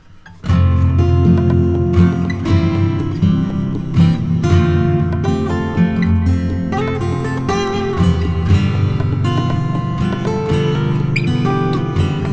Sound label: bongo; muzak; prairie